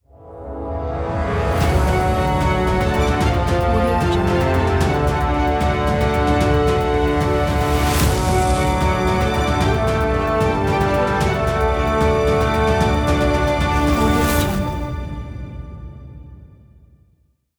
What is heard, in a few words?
orchestral logo